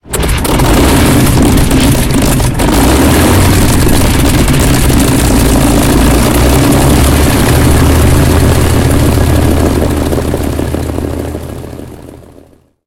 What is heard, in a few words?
engine griffon start cockpit; War Thunder